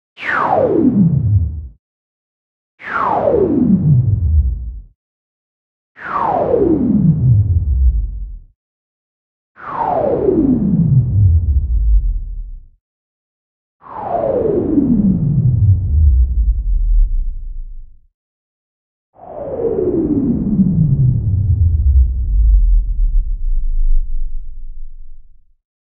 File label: Similar To Tape Slowing To Stop